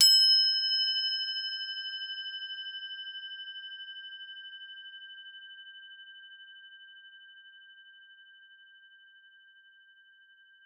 CRO G4, CROTALES; Xsample VOL-4

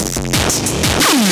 Industrial Crunch, Drum Loops

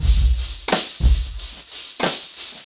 90BPM, acoustic drums; The Roots Drumloops

